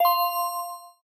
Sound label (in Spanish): campana; Steven Universe - Beach City Drifters